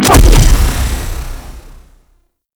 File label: Bolt Bubble; Warframe; Ranger